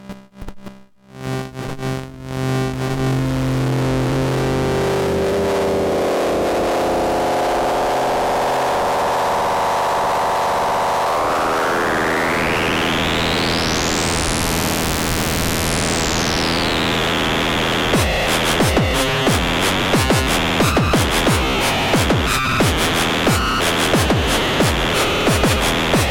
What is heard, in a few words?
noise beat